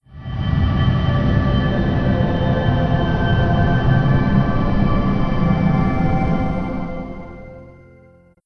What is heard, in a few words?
Windows NT Beta Startup; Windows Never Released Soundpack 2